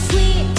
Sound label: tweet